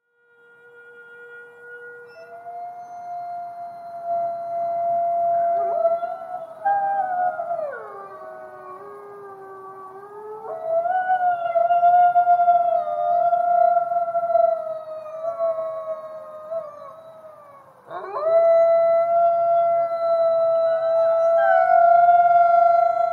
HOWL

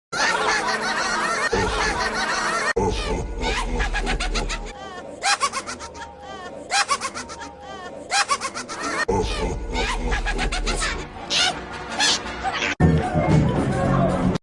Laugh

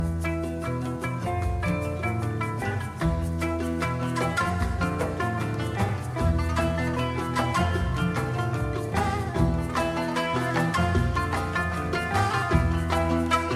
Ringtone

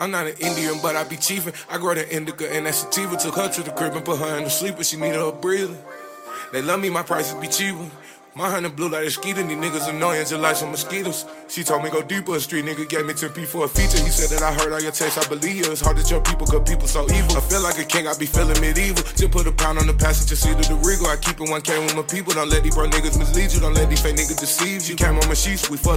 Breather

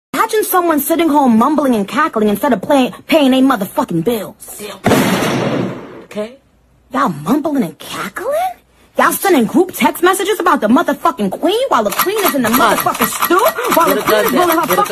Mumbling and cackling

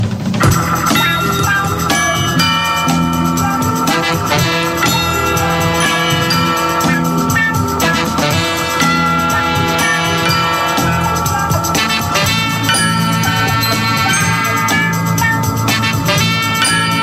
Funky Chimes

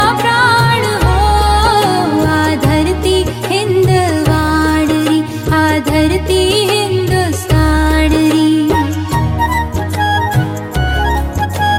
Desh bhakti ringtone

